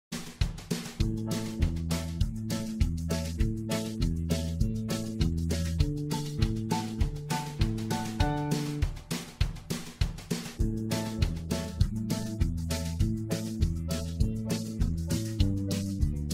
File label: same lick different ending, backing tracks